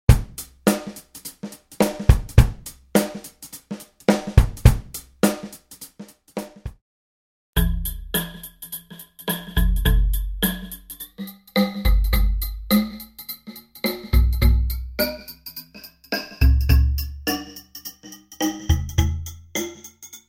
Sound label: Drum loop